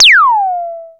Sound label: ZAPPY; Back In Time Records; Big Bang